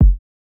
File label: kick, house